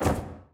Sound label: chase flap; fallen